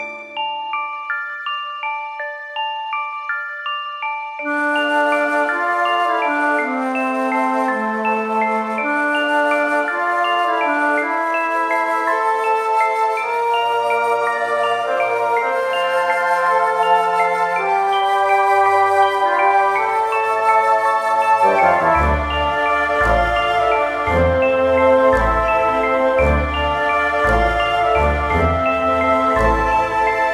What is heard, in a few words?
Waltz